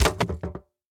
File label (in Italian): Barricata; BLIND QUEST - The Enchanted Castle